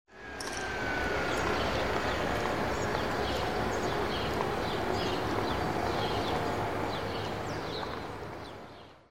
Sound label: smalltown street